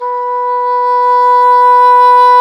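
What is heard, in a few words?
WND English Horn, Swell; Roland LCDP04 Orchestral Winds; Eng Horn Swl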